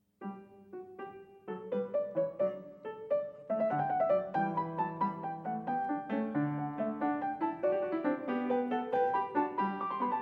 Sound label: Bach Goldberg Variations a 1 Clav Canone all'Ottava, PIANISTS